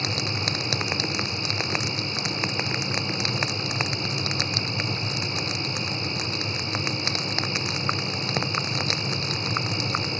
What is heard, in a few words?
Campfire